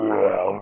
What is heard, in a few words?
elemental attack